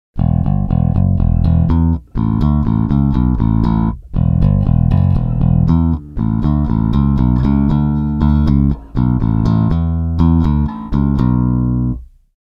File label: butler5 both pick; cms dead butler5